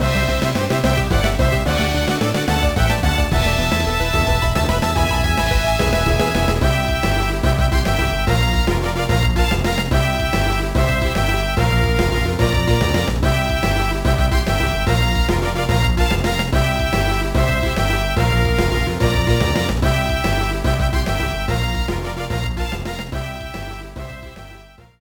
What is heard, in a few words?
Fanfare; Golden Sun